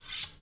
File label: Unsheathing Sword Slow Dramatic